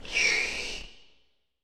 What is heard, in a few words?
minimare hiss